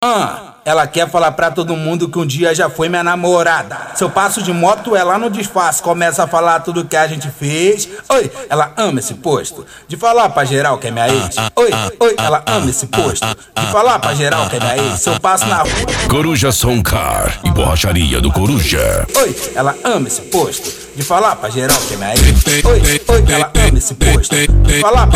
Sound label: funk; coruja som car e borracharia do coruja